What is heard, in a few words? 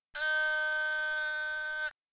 haegeum